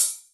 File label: Hi-Hat, Yamaha DTXpress; drum machine